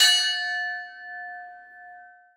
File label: chimes f#5, percussion; Sonatina Symphonic Orchestra